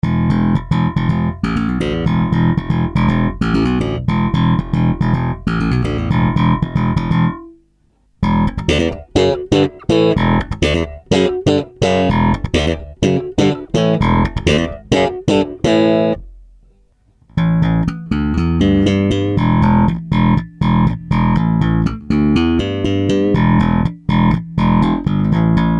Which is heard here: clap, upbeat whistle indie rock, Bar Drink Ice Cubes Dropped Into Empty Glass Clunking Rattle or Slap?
Slap